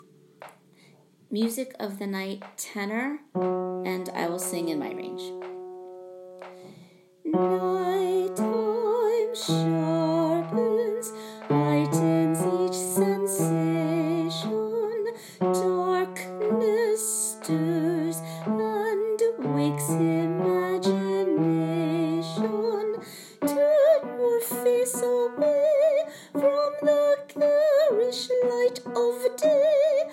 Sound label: Tenor; Broadway Shabbat